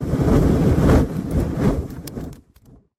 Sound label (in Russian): plamya vspyihivaet rozjig, zvuki fakela